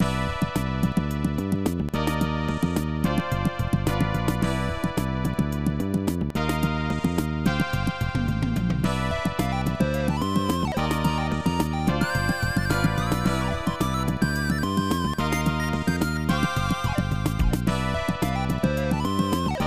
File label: Victory Song; Skipp and Friends; SNES